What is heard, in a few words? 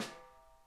SNARE EDG; Sonic Reality; Interactive Drum Kits